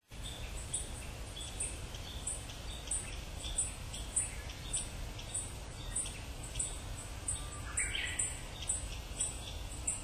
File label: black bunting